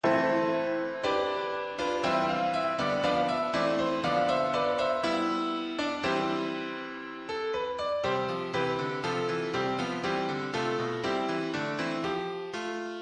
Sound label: piano solo